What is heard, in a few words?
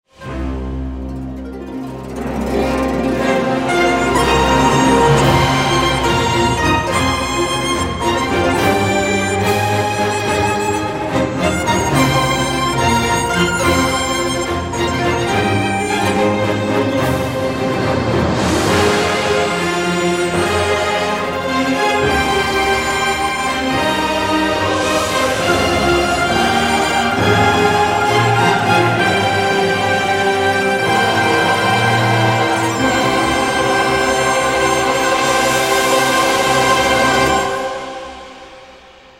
bg music